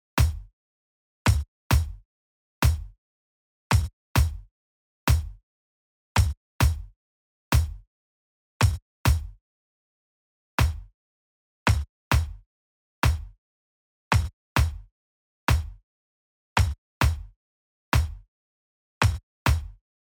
kick

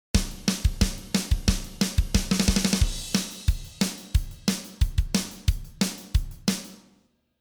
drum Preset Off

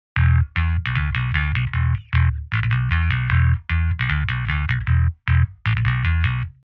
bass on

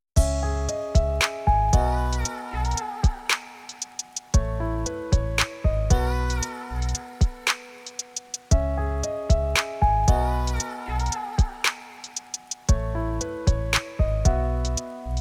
drum On

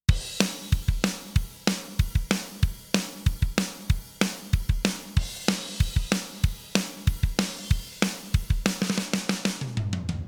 drum On

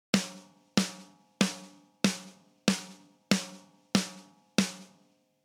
Snare Off

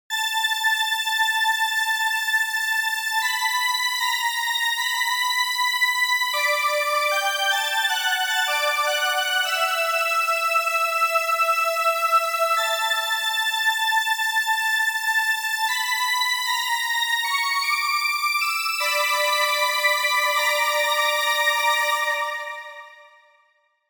synth Pad Off

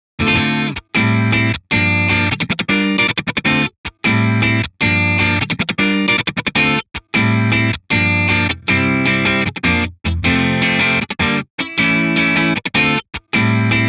amp

